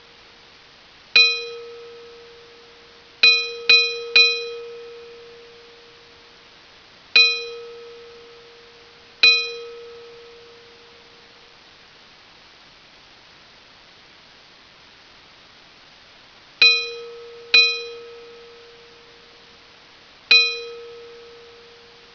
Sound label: bells; vort